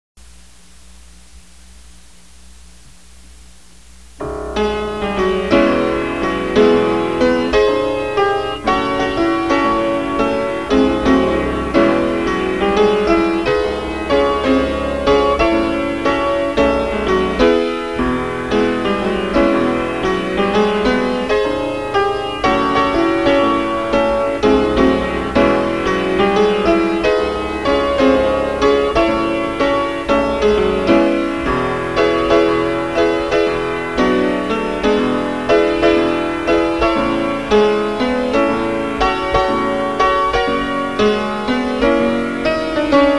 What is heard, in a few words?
PIANO